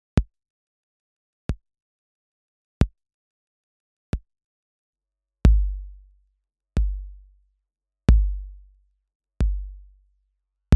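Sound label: bass drum; Yocto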